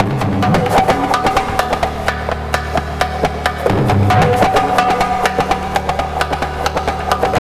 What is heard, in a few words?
Tabla, 130 BPM; Zero-G; Pure Tabla